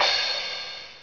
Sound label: HIGH CRASH; Dark Forces Demo; Dark Forces Instruments